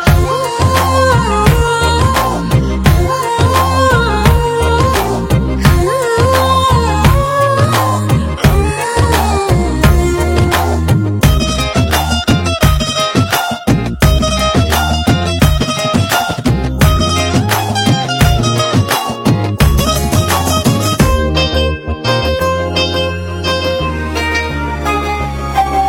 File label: Humming Tone